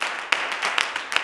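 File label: CLAPS 96, Gospel; Blues & Soul; G.CLAPS 96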